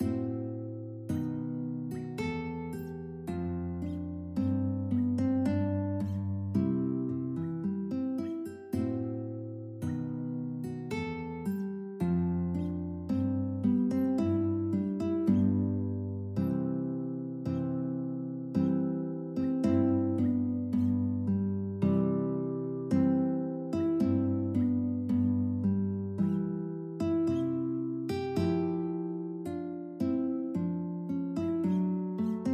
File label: Wedding March duet, guitar duet